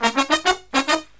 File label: Brass Riffs